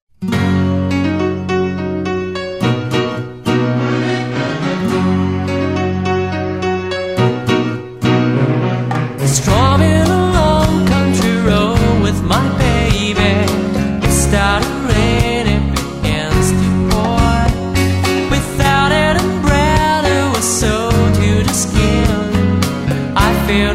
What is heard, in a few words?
Ukulele